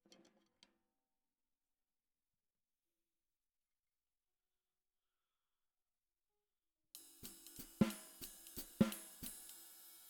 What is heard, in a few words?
SNR